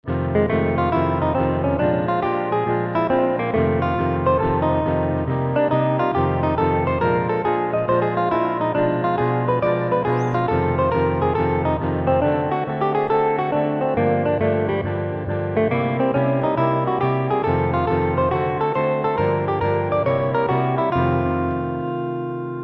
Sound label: Jazz Tune